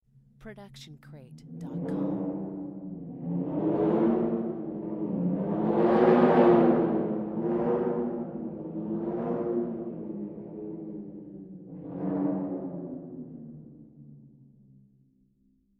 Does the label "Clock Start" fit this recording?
No